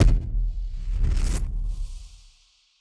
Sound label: Hit Splosion; musicradar; bomb factory